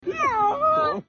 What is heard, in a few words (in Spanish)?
gato me ahogo